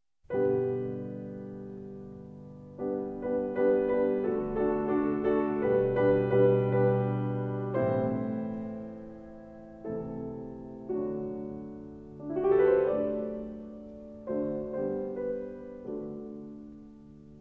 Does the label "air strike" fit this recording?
No